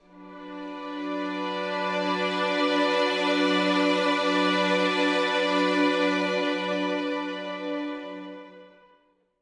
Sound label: Loop String Swells Bm 2nd Inv